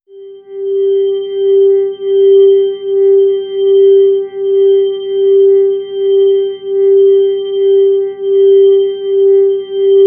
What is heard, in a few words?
organ tone g